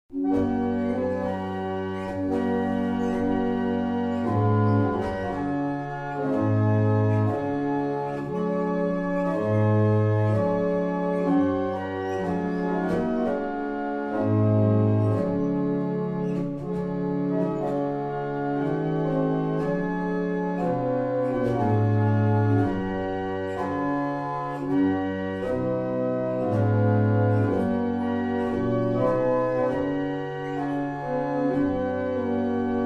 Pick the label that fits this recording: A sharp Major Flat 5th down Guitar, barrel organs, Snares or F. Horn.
barrel organs